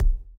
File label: Ball Player Hand Hit Tackleball Light